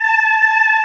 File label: ANGLE CHOIR A; ANGLCHOIR